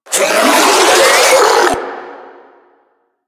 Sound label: Spin Scream; Enemies; Abilities; Warframe; Proto Infested Ancient